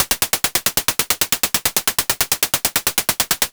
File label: Anabeat Hat